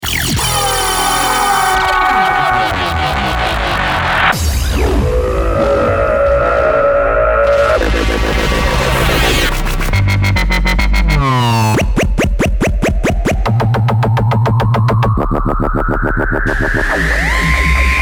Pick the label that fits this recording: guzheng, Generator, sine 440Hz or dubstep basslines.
dubstep basslines